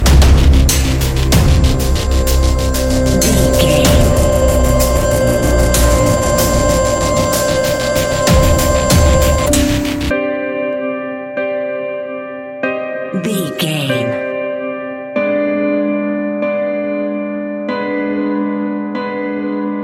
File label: ephemeral shadows modern horror